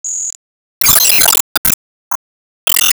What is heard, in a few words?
Enemy Thunder Bolt; gamerip